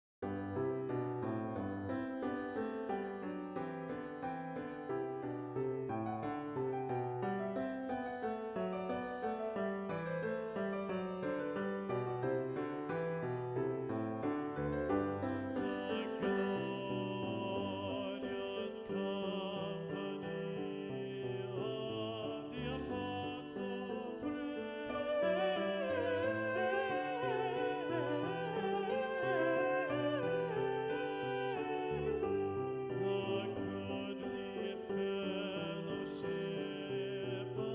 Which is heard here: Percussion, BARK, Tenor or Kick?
Tenor